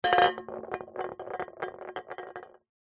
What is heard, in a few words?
foley drink can empty set down on concrete wobble; zapsplat